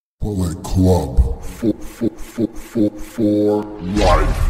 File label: bullet notification